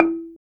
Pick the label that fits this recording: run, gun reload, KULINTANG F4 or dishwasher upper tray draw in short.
KULINTANG F4